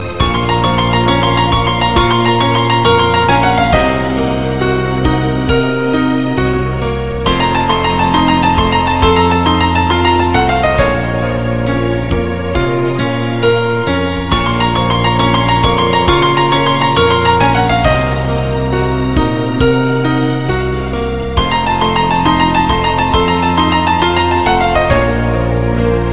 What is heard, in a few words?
remix piano solo ringtone